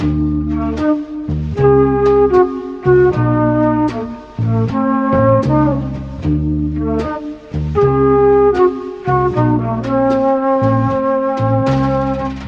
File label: flute sweeping changes